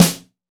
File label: Natural Hard Snare; Snares